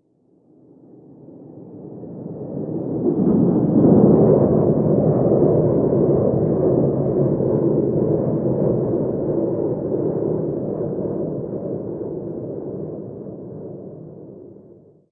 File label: jet flyover int; amb elements; modern warfare 2